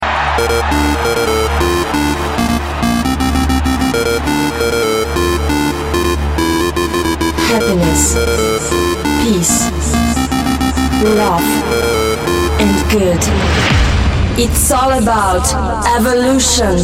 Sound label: Dance; Planet